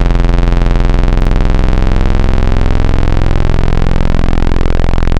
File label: PWM Juno, Analog; Xfer Records Serum; Serum Presets